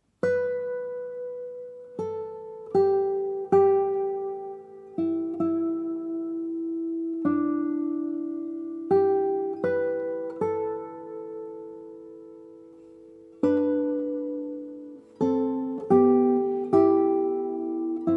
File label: Nearer My God To Thee (Arr. For Guitar)